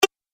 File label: Multimedia Button